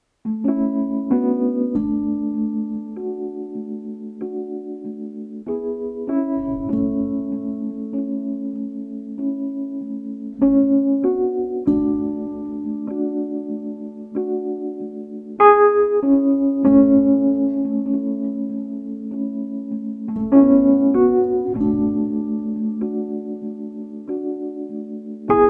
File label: Electric Piano Duets